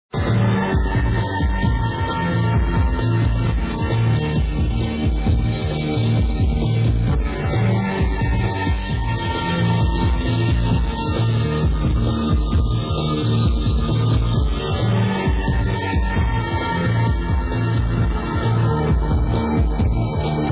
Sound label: electronic music